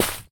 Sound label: hay step; Golden Crayon Sounds